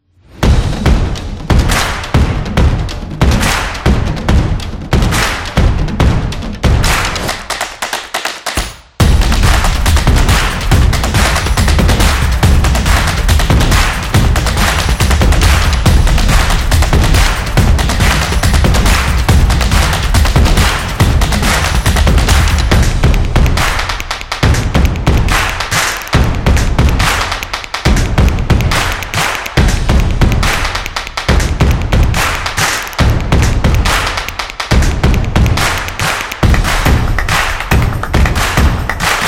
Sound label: Drumming Music; soccer stadium